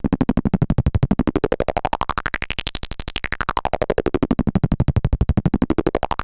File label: SPACE WARP; Greytsounds VOL-1; STEIN-PARKER